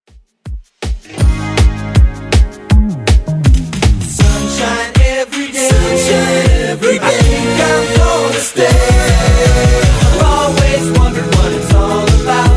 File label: 80s 160 bpm; Redrum